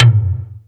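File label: DRUMTRAKS mid tom, Drums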